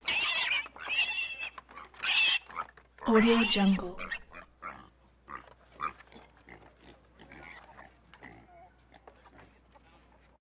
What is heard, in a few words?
pig grunts